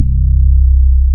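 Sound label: BAS MKS-50, bass, Roland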